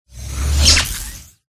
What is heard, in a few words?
dragon warrior